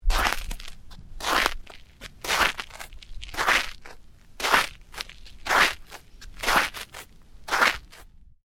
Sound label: Footsteps: sneakers walking on ice, hard and crunchy